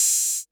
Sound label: 808 HH Open; Concrete Collage Drum Kit; Concrete-808